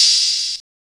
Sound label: oh, open hats